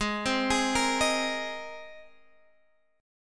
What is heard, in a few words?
Ab Major Add 9th Ab, Guitar Standard; arpeggiated; 16,15,18,15,16,x